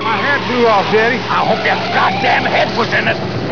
hat

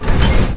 DOOR

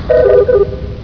close program; Babylon

